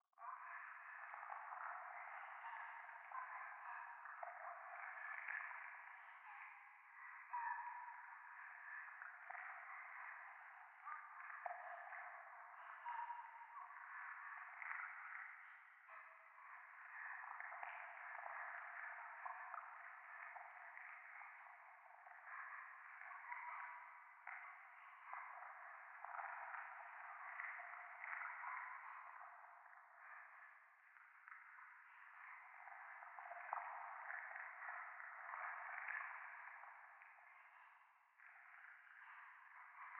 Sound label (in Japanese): 廃墟 右耳密着処女喘ぎ 触手